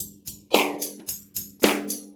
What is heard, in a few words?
70s breakbeats loop; USB Soundscan vol.46; AKAI